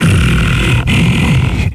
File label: growl; css; kfsounds